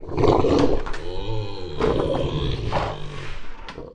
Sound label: beast idle; morass beta; morassbeast